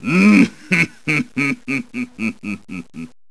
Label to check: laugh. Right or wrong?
Right